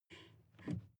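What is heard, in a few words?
sports car interior pedal brake pump soft; verkehr transport; autos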